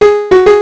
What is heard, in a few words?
SLIDE; Spreadtrum source code audio